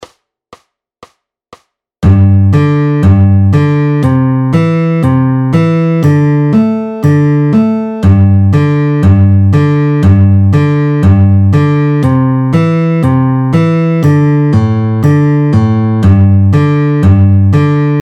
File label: Fast, fingerpicking